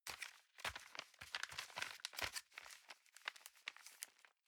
envelope swap sequence

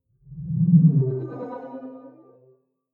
sci-fi display transmission damped

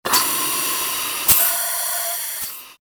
ekg respirator breath

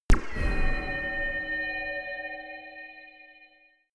chiming laser shot

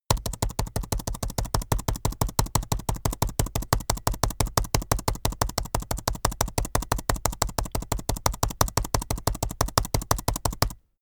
keyboard laptop backspace sequence fast hard; Computer

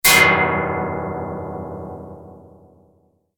metallic rough

